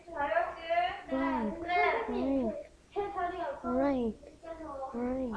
laugh

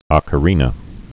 ocarina

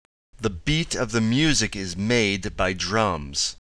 drum

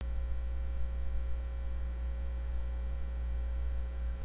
breath

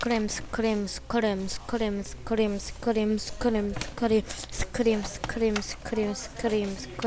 scream

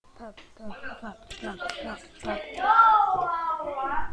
pub

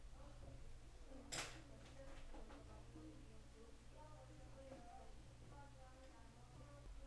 door

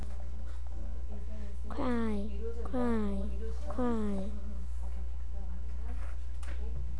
cry

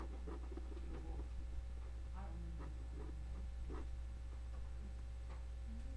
laugh

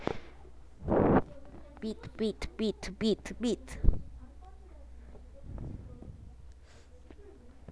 beat

